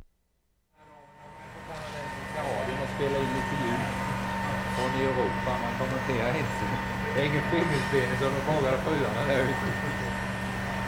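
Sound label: STEM CUTTING, GLASS FACTORY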